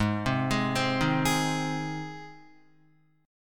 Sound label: Ab Major Ab down Guitar; arpeggiated; JGuitar